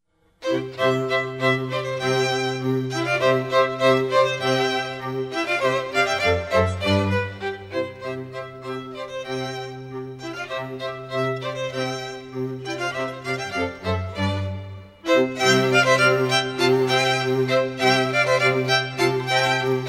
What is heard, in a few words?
Spring, Classical String Quartet; Four Seasons